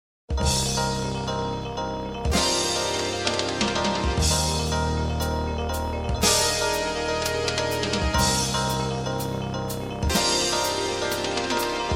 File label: Music Noise